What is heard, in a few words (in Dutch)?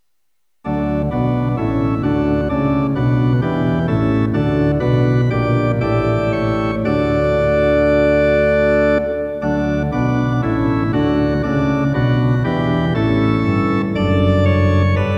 Orgel; De Heer is waarlijk opgestaan